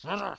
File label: boss laugh; Super Trench Attack 2